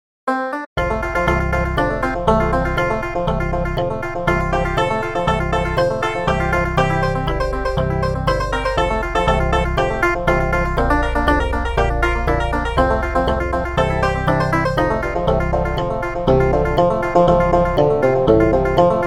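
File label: Traditional African-American Folk, Banjo, Flute; VSTi; Banjodoline; Syntheway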